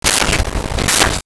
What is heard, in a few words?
spray can lid; Bathroom